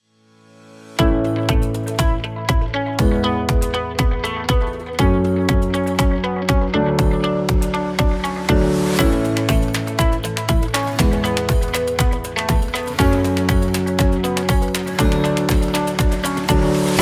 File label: Corporate